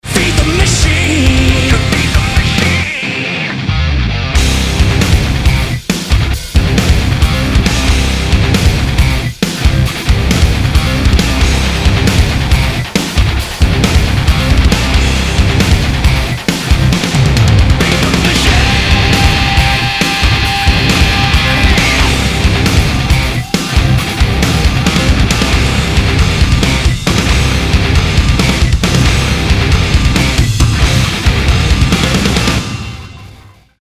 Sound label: blows his flute; carving the truth